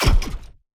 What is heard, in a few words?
payload Drop; Mindustry